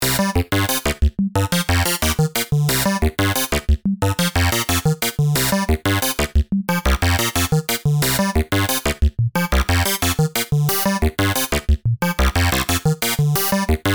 evolve no reset, The pitch, gate, and velocity of steps are shifting, but doing so in a slow, subtle, almost subliminal manner.; Numerology; Five12